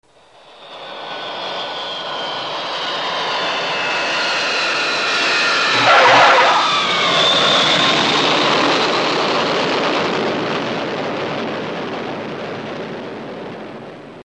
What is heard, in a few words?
sst Landing